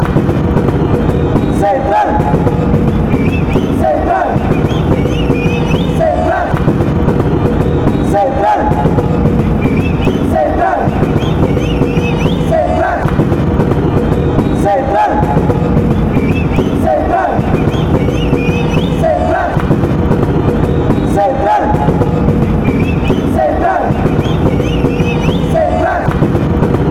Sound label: la liga chant